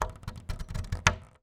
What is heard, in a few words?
household lock bolt small open or close slow close up